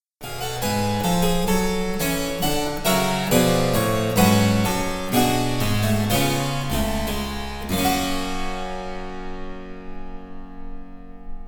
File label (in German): Cembalo; Mark Lindley WTC 1